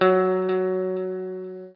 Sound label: NOODLE LONG, Guitar & Bass